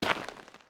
Paso tierra

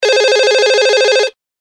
Teléfono ring

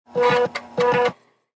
Ruido floppy

